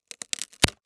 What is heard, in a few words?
Crash Wood Break; crashes; AdobeCC